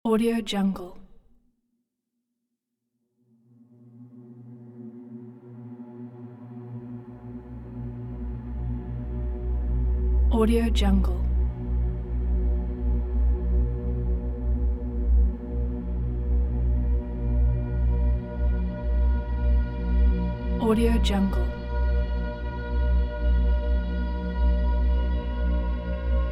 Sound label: deep slow space soundscape